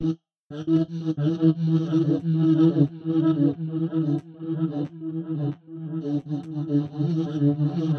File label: Mumbler